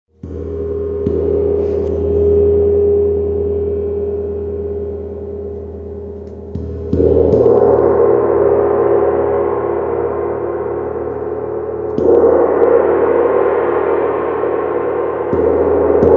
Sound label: windgong, Wind Gong (Lapgong) 70 cm; 70 cm átmérővel; Gongok; Különleges hangszerek